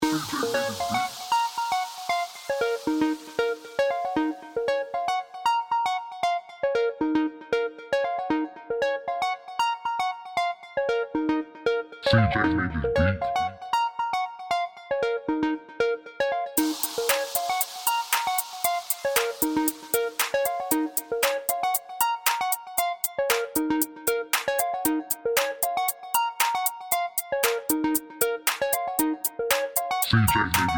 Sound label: Electro Beat